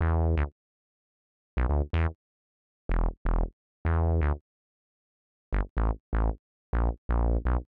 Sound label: 125 res synth bass E; PowerFX - Nu Jazz House